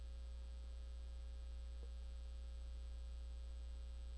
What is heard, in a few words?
sharpen